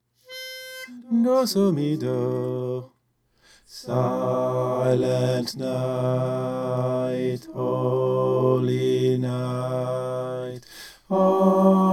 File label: bass